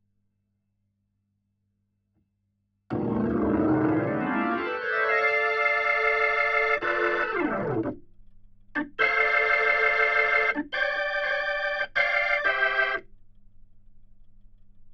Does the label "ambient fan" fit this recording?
No